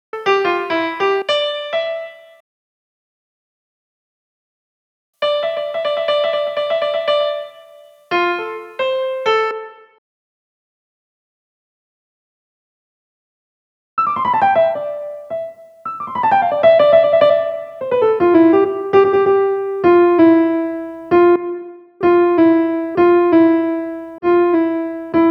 piano full temps guitare

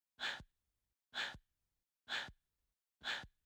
souffle seul

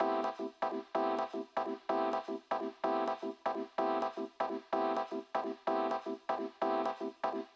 rhodes repet

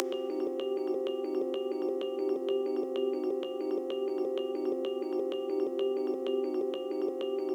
melodie piano elec boucle

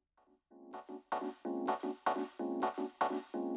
fondu reprise rhodes

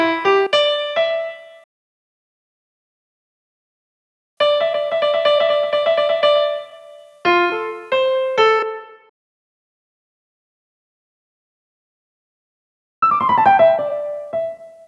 piano pour guitare

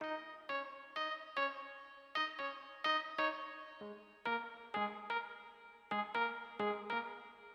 melod aigu

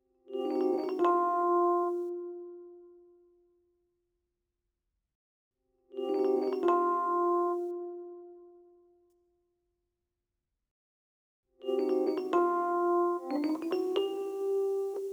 melodie piano elec

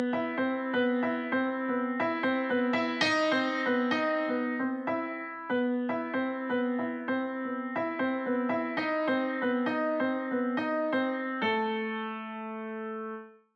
piano qui tourne

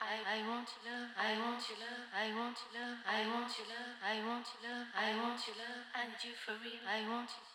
voix

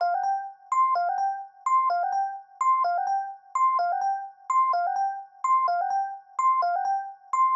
melod aigu seul

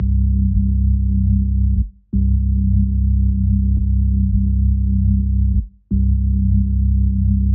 basse break

